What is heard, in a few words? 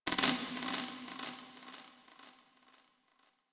ship metal knocks texture small; Ambience; Warframe; Corpus Ship Remaster